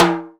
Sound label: TIMBALE MD, Drum Mashines, SR-16 DRUMS; EdgeSounds